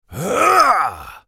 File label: effort